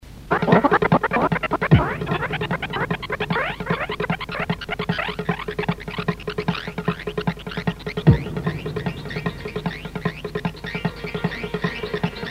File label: live train